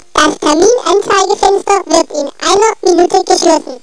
SCHLIESE

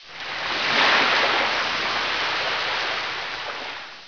Wellen; PC Gamer IT CD 48; 1602 AD; 1602ad demo

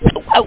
aua

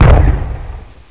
feuerwerk bang; Revista CD Expert 42; funrun

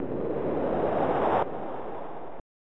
Drachenatem

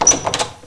Bodenklappe

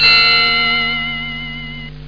kling

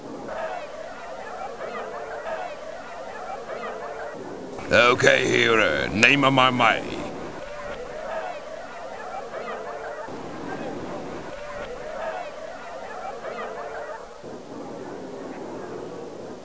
Pferde; De Reis Om De Wereld in 80 Dagen

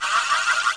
uhr drehen; Loewe1

